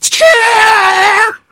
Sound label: engineer pain severe